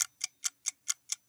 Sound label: time warning